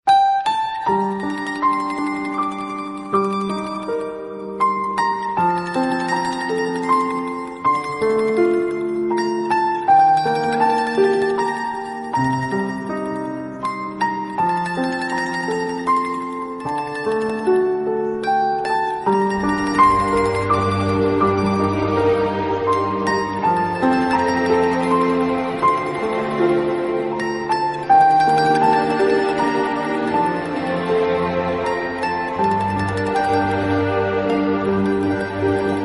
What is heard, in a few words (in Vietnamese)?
Nhac Phim Nhac Khong Loi Buon